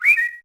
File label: Whistle; SKY IM-U680L; Camera